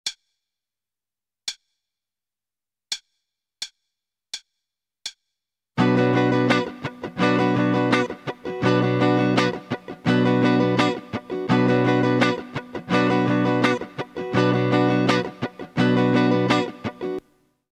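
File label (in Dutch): Ritme, gitaarstarter; Meespeel CD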